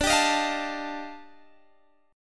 D sharp Major Sharp 11th, D

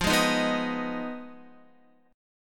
F Minor 7th Flat 5th, Guitar; strummed; JGuitar

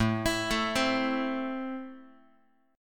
A Minor A down Guitar; JGuitar

